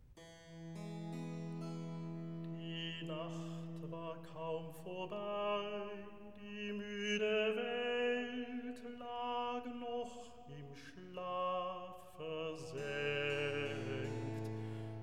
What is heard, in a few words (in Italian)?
Recitativo; Die Nacht war kaum vorbei; Handel; Brockes Passion